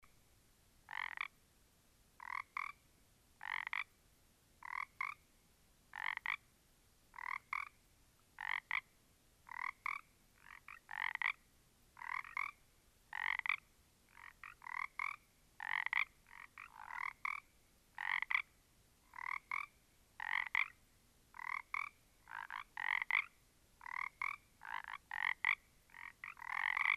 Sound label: Small Group of Frogs; olofonia